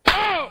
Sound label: Slap